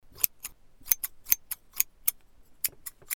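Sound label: scissors; son isole; ciseaux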